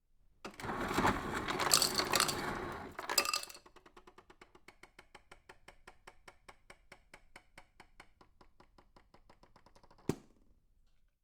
ice machine into cup

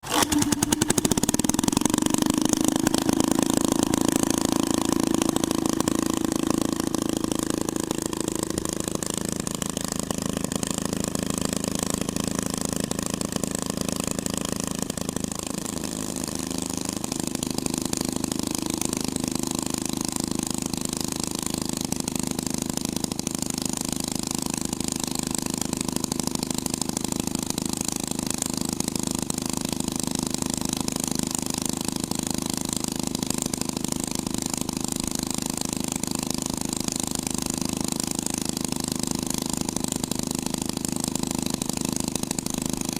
hot rod twin engine

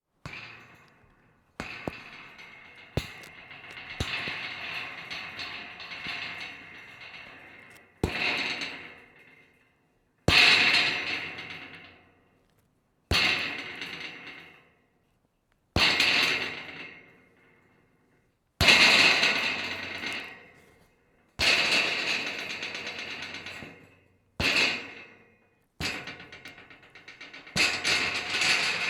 metal scrap rattling

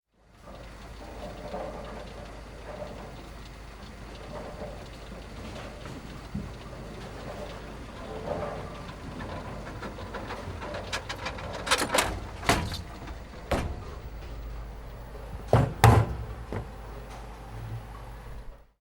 bowling ball return

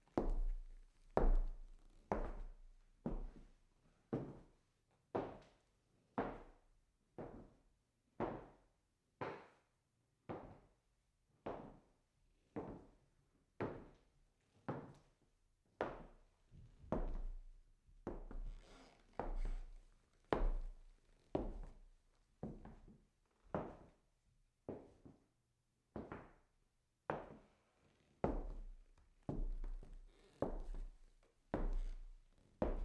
distant footsteps on wood

